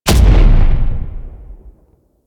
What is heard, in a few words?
Tank Cannon Punch; Enemies; Weapons; Warframe; Lasrian